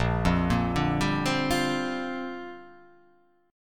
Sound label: A sharp 6th Add 9th